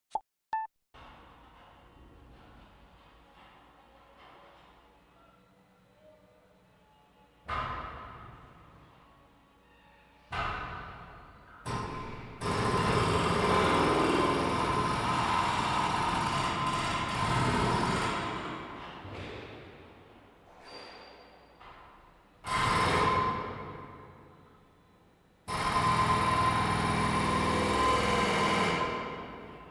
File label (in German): presslufthammer